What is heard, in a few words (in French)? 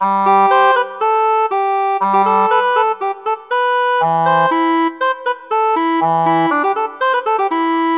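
flute irlandaise; MOBICLIC 14; europe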